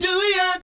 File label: DOOEEOT, Vocal Jazz Fx